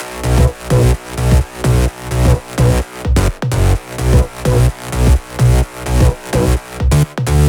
128BPM Melody; Vengeance Samples; Neo Pop Kit

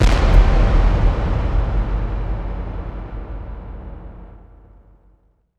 Bassdrums FX, FX Kicks; Vengeance Samples

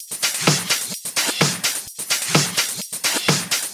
Minimal Loops

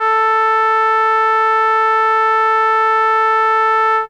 Horn A4, ARP Solina, Unmodulated; musicradar-synth-samples

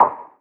Percussive FX; Percussion; Vengeance Samples

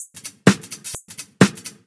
House loops 128BPM; Vengeance Samples

